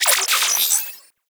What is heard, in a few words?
Digital Glitch; Ambience